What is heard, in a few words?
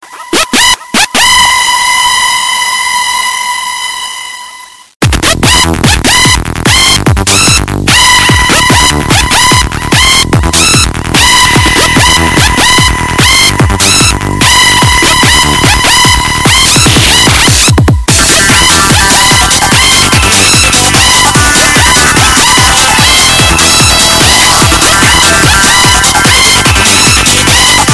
ringtone; Vertú